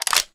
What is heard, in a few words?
svd magin nomen, weapons